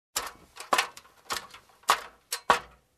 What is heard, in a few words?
Footsteps on iron platform